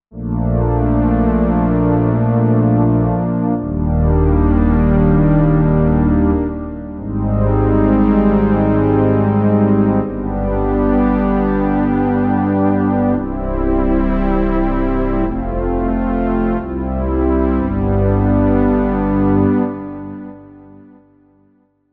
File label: Brass CS80 Brass, synth